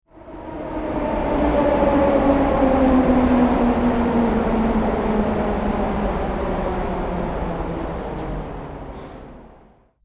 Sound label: Subway arriving